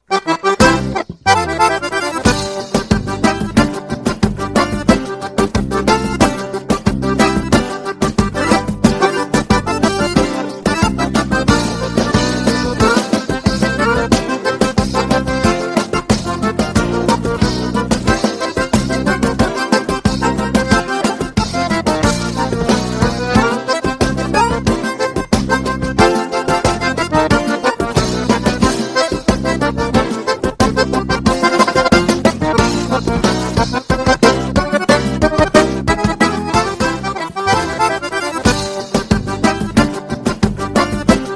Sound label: instrumental